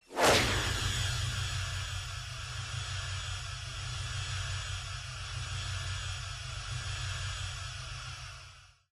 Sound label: stun long